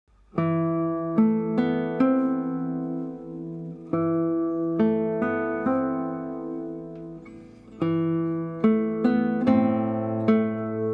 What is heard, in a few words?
Polish Patriotic Song Guitar Arrangement